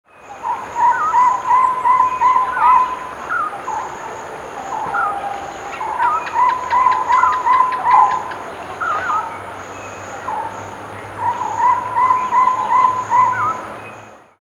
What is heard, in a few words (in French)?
chevêchette à dos marron